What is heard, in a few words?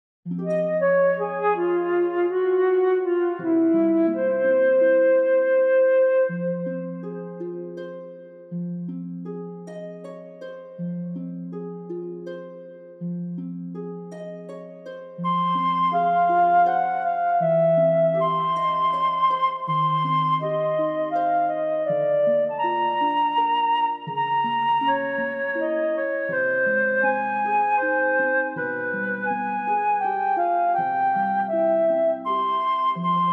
Kazabue reverberating with loneliness, bgm